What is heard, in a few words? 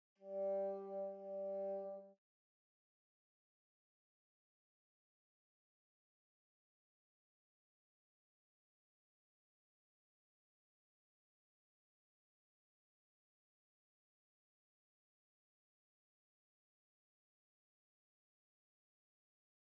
Bassoon